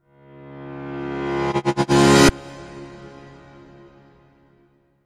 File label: piano cymbal opening chord reverse; audioblocks